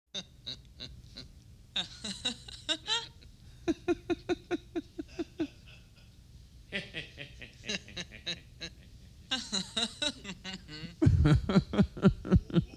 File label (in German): kettenlachen